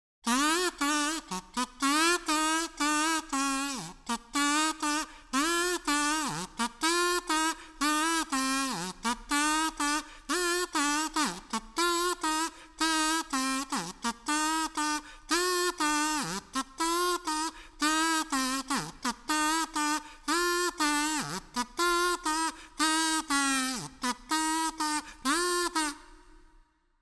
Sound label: Trumpet